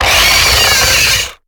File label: Stealth Attack; Lurker; Evolve; Gorgon